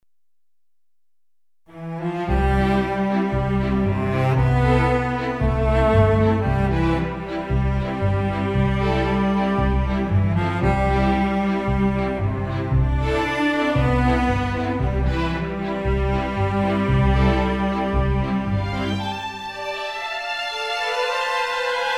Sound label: solo strings lighthearted